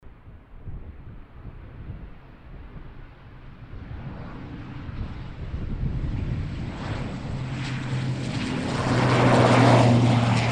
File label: Airbus A320 aircraft taking off from the runway